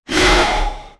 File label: a shadow spell cast effect, magic